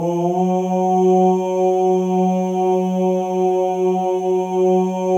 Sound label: male ohs; Spectrasonics; Symphony of Voices